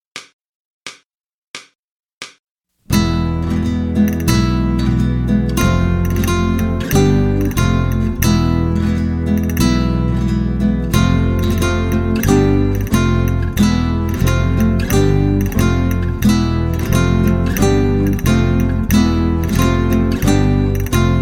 spanish theme

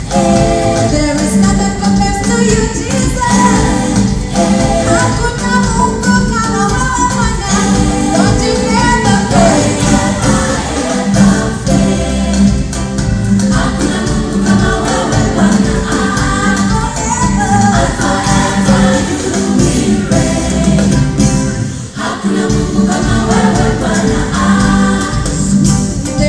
choir; touring australia

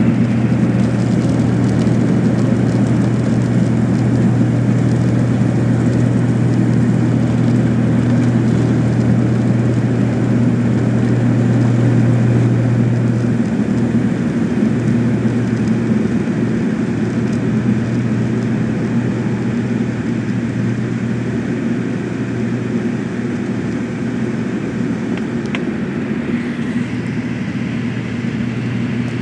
take off

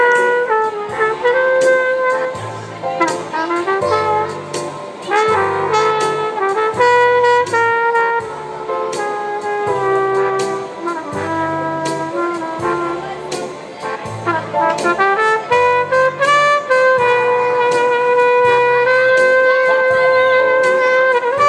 trumpet

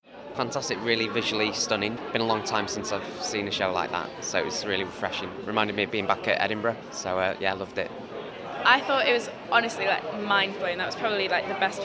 audience reactions; the wedding

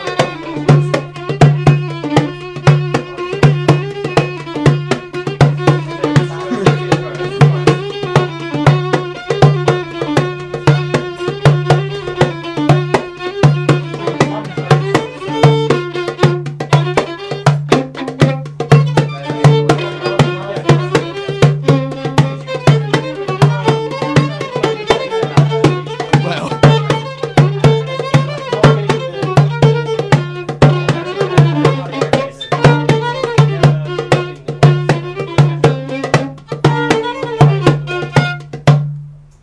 more klezmer

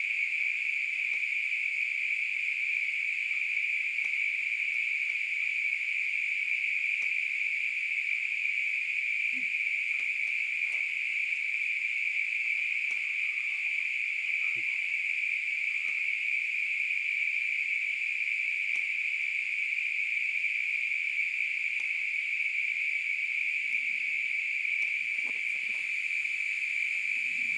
crazy crickets making a deafening sound

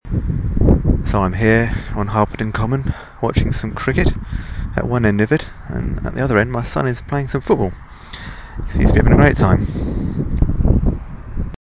sport on harpenden common

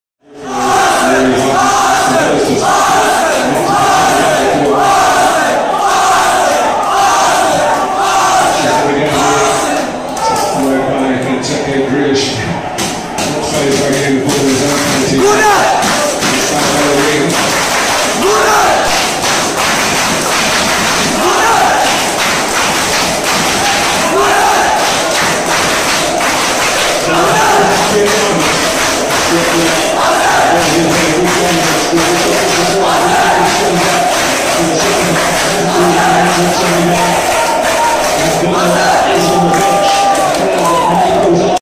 fa cup final chants

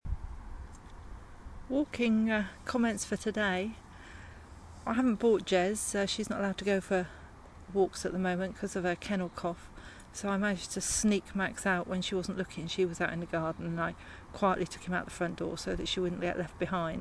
walking

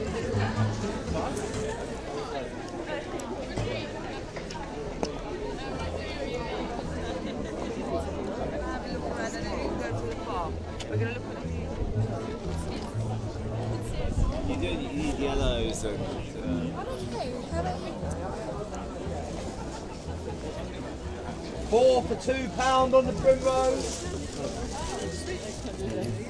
flower market ambience

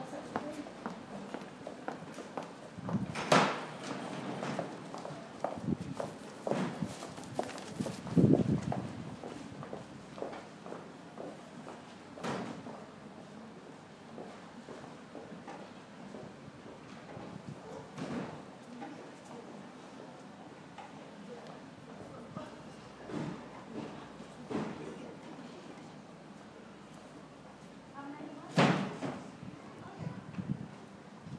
york street ambience

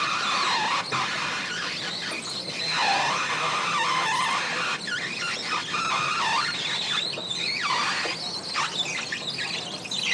squeaky escalator

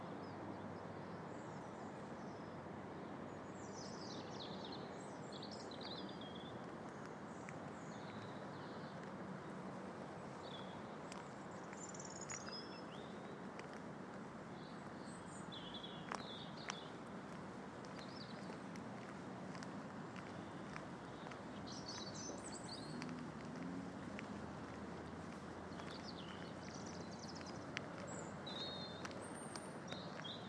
birdsong; priestfield

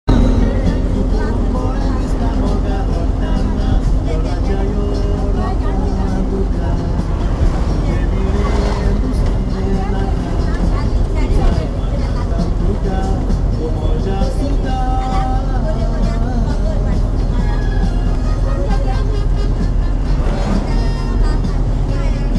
konkani music in a margao bus

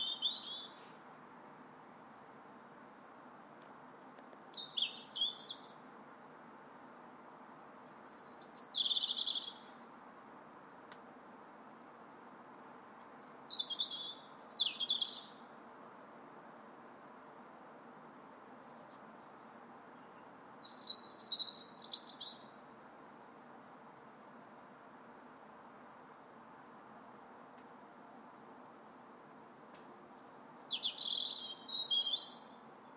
good morning birdsong

